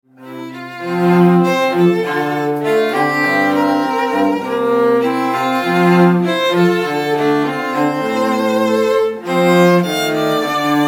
trio

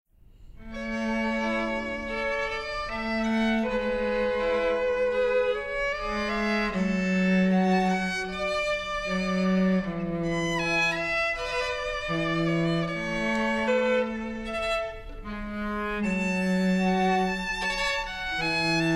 trio arioso

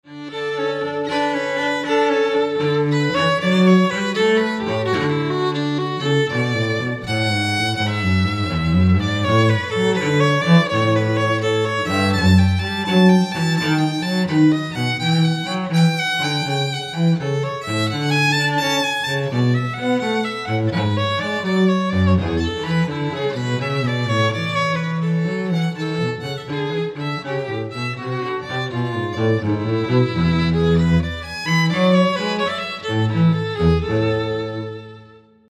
gigue, duo

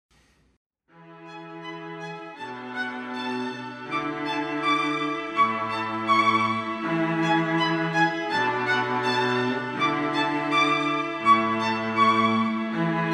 trio

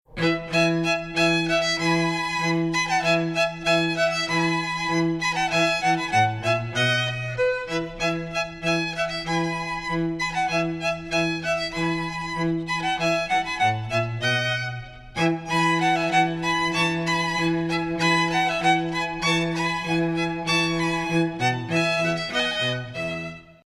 trio

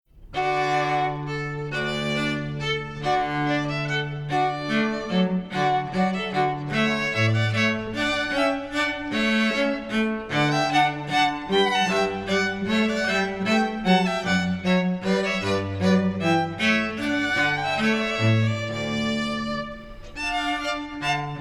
trio minuet

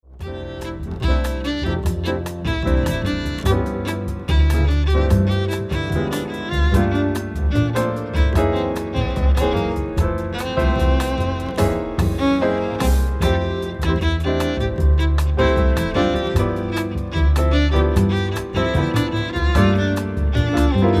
jazz

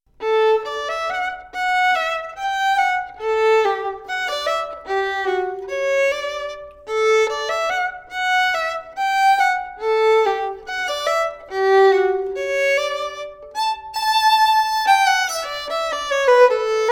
SOLO; sleepers awake

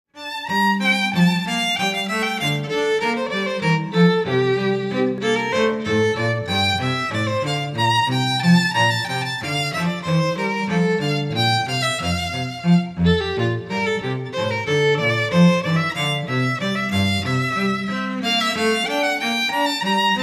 bouree, duo; handel

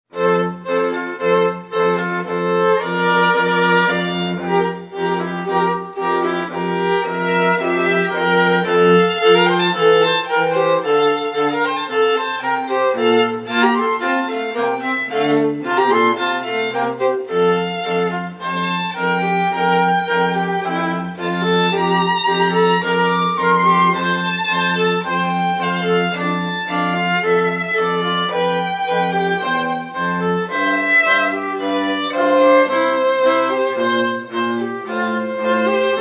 trio; sheep may safely graze

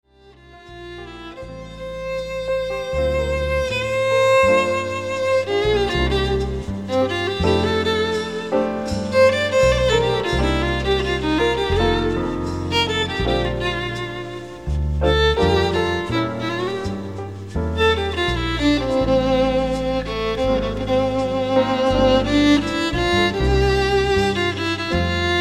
jazz

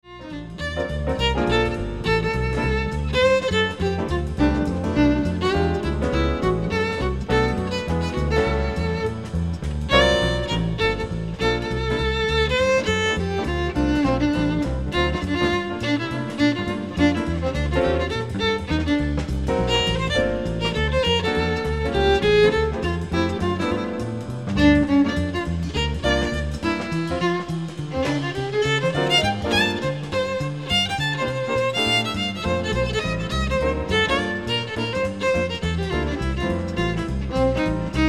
jazz

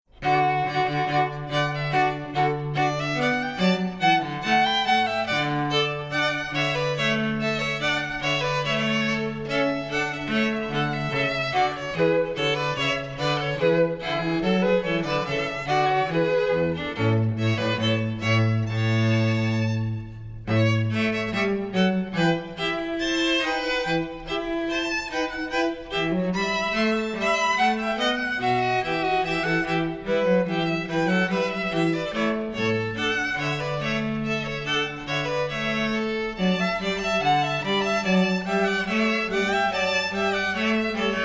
trio march in d major

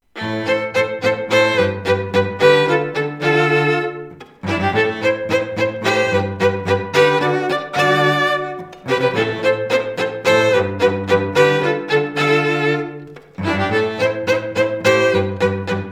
trio

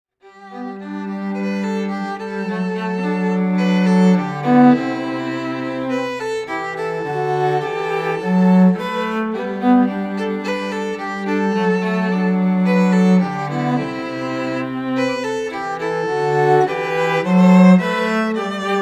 trio

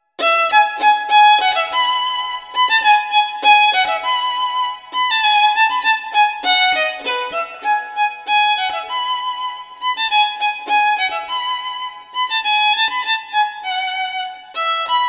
violin; Spring

